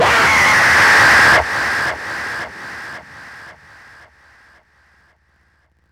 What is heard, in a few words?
Death Scream